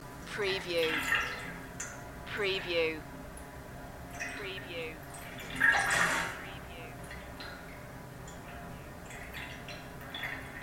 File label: water pipe inside